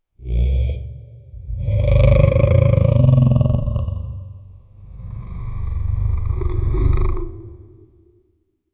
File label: creature roaring with it, monsters